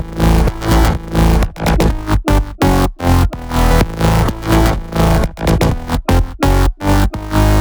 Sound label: 126BPM Melody; Vengeance Samples; Purple Kit